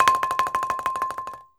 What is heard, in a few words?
BALIFON, Pitched Percussn; E-MU Producer Series Vol. 7 – Old World Instruments